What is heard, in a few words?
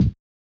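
Bass Drum; Yamaha DJX-2B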